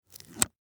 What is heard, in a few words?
Band Aid Peel Plastic Cover Off; Emergency; Ripping; Objects